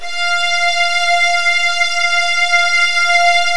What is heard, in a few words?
F2, Virtuoso Strings